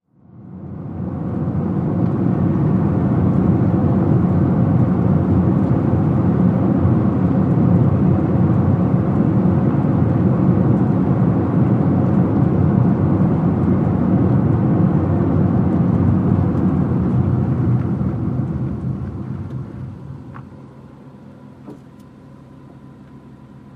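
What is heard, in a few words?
Small Car At 25 35 Mph, compact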